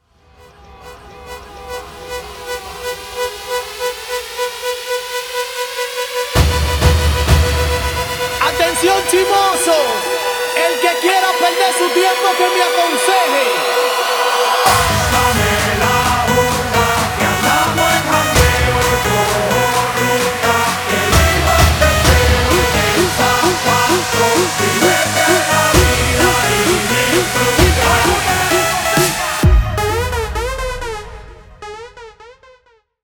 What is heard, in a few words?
intro outro 130 bpm